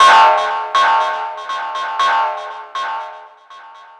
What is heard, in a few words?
SFX, Trance NRG